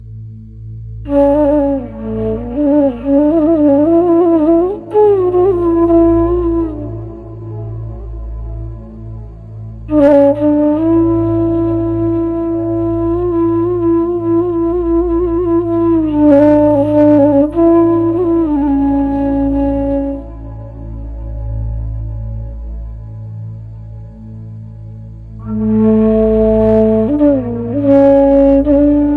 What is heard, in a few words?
Indian Tantra Flute